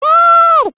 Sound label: Mooing; Pikmin Mooing